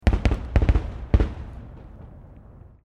Shooting in the street

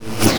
Woosh dry; افکت صوتی

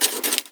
Drawing with pencil and marker

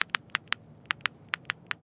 bird pecking; افکت صوتی

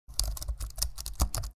type laptop

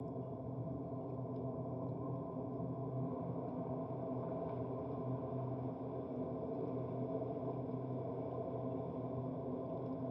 tunnel; افکت صوتی

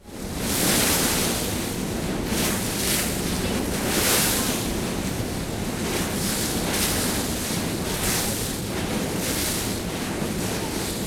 wash

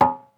metal

